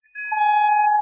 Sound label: beep alert chime